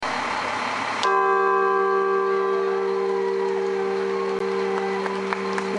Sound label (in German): Klangprobe Ewigkeitsglocke